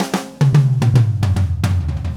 Red Maple Groove 110, DW Red Maple Kit

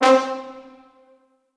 C3 R, Orchestral Brass

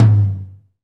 TOM TOM, Roland

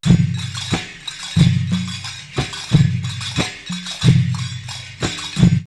Djinn 90, drumloops World Ethnic; X-Static Goldmine

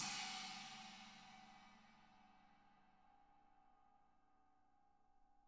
CHINA 20, CHINA CRASH; ILIO; Double Platinum Drums 2

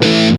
METAL POWER; East West; Global Sounds

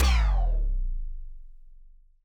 TD-20 Tom B, Roland TD-20 Toms

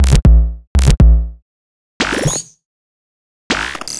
Robot Thunder, Modular Electronica; Beats; Sonic Foundry (Sony Creative Software)

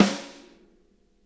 snare, drum kits

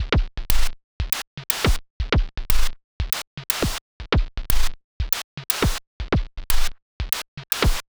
Rolling Bubbles, Beats; Sonic Foundry (Sony Creative Software)

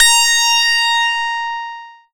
PLUCKED, Synclav FM, A#; Big Fish Audio

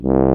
LO TROMBONE; East West; Global Sounds

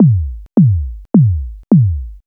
SYSTEM 100; AMG - Vince Clarke Lucky Bastard

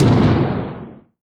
T-TOM, PERC; NorthStar - The Wizard VOL-2; TSAR PERC

